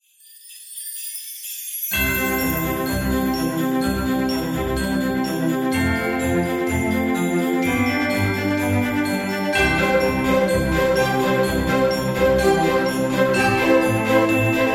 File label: Cinematic Upbeat Christmas; xmas